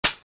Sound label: oicw foley